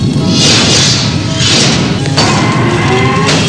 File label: chevron lock; stargate